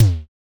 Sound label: DSM2 Tom; MPC DSM-2